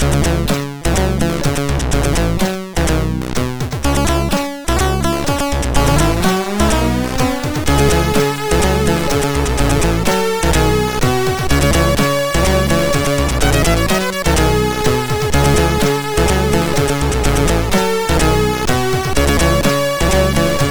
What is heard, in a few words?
Short Tune, MOS6581R4